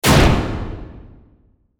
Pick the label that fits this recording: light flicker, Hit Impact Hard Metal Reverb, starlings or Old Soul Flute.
Hit Impact Hard Metal Reverb